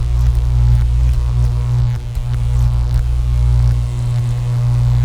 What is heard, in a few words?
Laser Loop